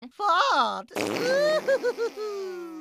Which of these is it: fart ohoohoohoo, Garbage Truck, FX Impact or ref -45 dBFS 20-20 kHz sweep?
fart ohoohoohoo